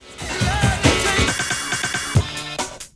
Stabs

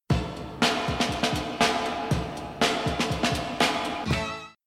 (Forward Wind) - Break

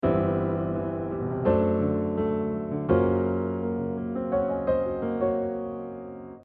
enharmonic modulation

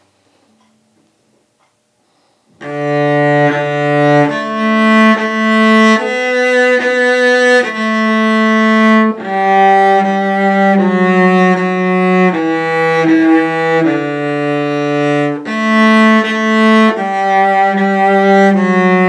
Twinkle Twinkle (Theme)

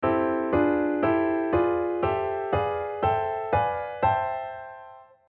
6th dim major harmonized

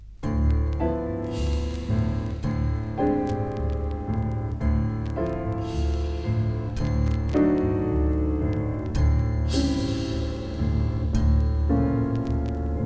rumba

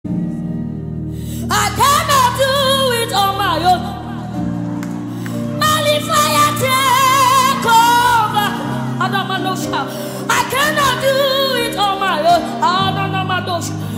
gospel

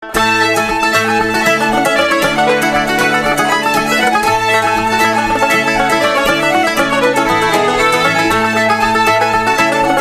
Eightsome reel

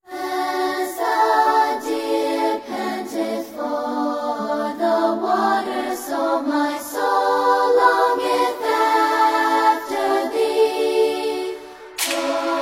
Drill Remix

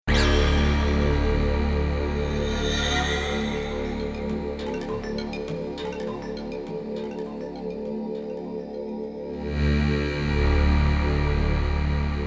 Pad

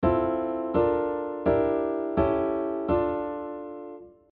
chord connection example

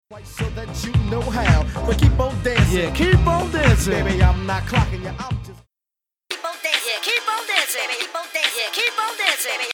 Vocals ('keep on dancing...') & instrumental

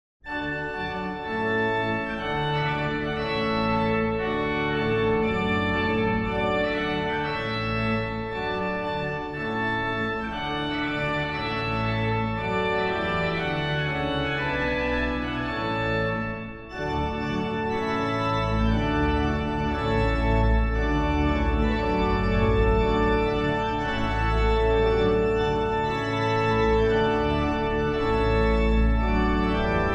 Trumpet Tune in D; H Purcell

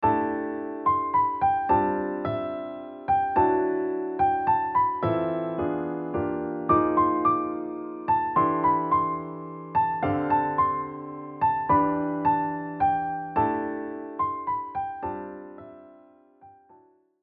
melody with A and B section

